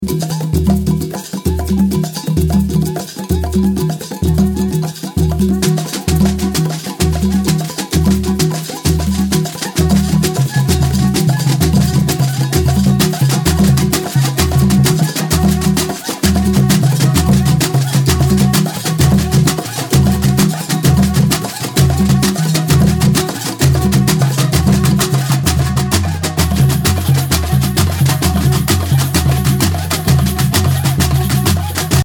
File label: Cabula Samba fast samba; brasilian dance practice